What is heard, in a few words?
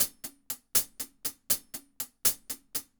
Rocky Mountain Groove, Drums